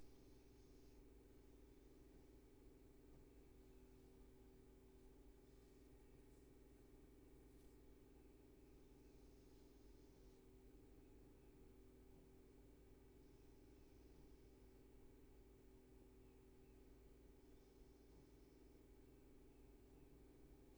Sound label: roomtone